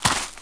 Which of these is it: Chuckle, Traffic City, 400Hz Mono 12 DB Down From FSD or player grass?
player grass